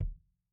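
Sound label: KICK LOW LOW KICK, analog recorded drum; STONEQ 4K; SATSON; SATSON CS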